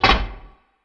Bridge Open; Myst III Exile

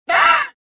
pain, rodian

